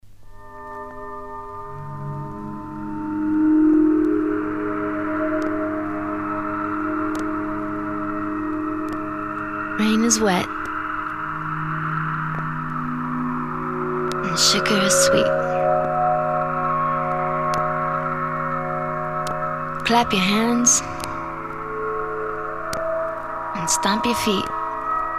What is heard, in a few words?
rain is wet; ringtones